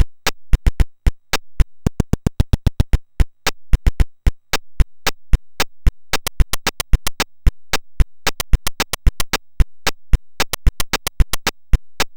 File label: Mini Digi Drum, MOS6581R4; 512 bytes BASIC